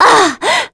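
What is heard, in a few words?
Vox Down, English; Scarlet; kings raid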